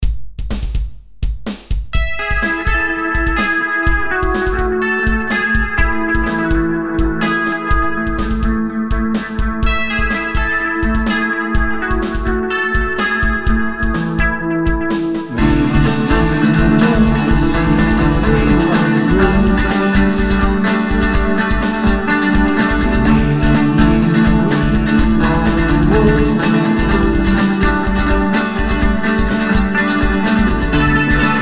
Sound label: Electric Lo-Fi Seresta